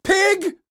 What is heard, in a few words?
police hit bad short; donnie; Blind Drive